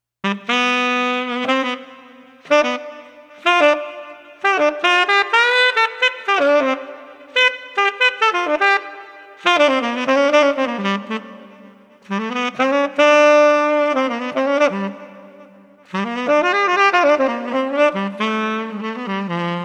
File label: Street Sax